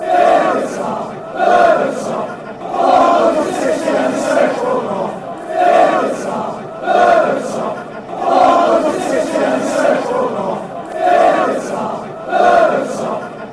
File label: fanchants; dimitar berbatov official